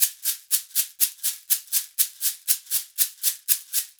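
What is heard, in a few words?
shaker, Percussion Loops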